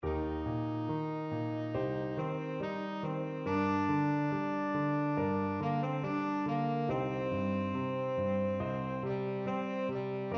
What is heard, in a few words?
tenor saxophone